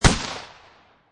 Shot Gun Mossburg 500 12 Gauge Single Shot Designed; Lynne Music